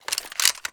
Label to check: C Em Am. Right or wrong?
Wrong